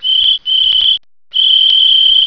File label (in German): PFEIFFEN